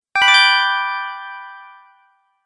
Open Menu, beep sound effects

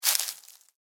Footstep: Individual Footstep On Dry Leaves; Footsteps; Human; Stuart Duffield

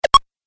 Retro Pong Tennis Hit; Video Game Sound Effects

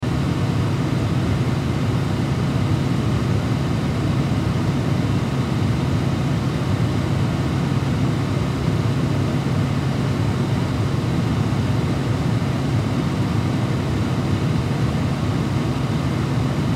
Heater Rumble Run, Heater Rumble Run Loop; Furnaces; Household; Blastwave

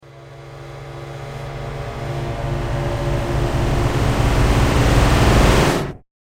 Supersonic Hyperspace, spacecraft sound

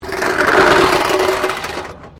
METAL BINLID SCRAPE WIRE FENCE

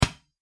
Plastic Ball Bounce; toy plastic ball single bounce; Cartoons; Bounces; McKinney Sound

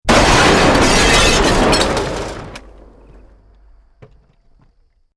Building Collapse Medium

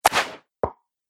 Archery - Shortbow Hits Target; Bow And Arrow; Bow And Arrow Sound Effects

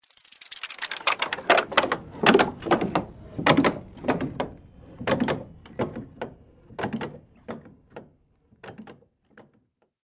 Sweep Mechanical Slow

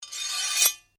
Blade Scrape Flick, Sword

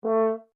Horn A4 Short, French Horn in F; Alexander French Horn in F; Horns; Musical

